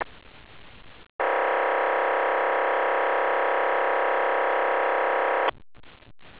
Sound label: vhf